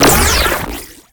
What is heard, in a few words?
Draining Trap; PvP Mirage; Warframe